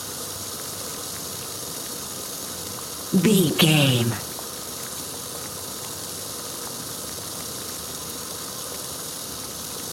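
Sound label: boiling water gas loop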